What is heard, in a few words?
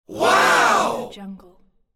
male studio audience saying wow